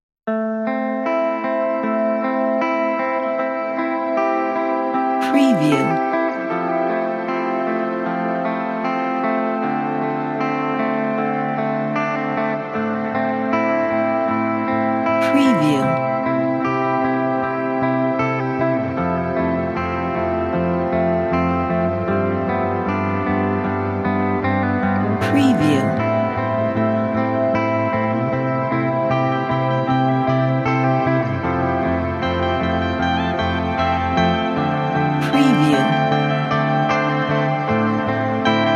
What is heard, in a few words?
airy guitar ambient